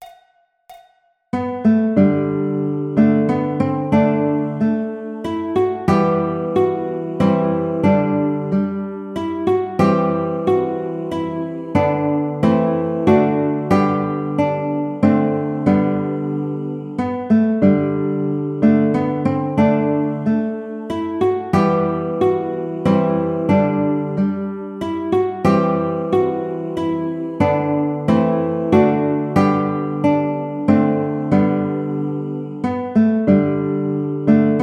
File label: Baritone ukulele; First Noel